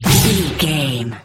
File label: sci-fi weapon blaster laser boom zap